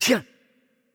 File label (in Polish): Attack Light; pl PL; bloom minigame